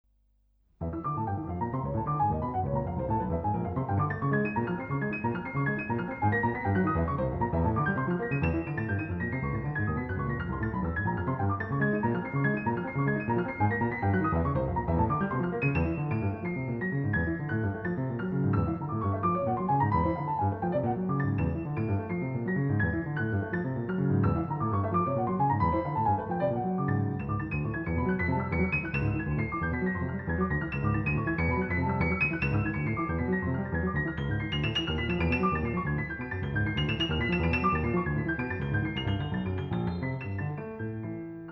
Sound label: Piano Sonata; Kirilauskas; C. Vine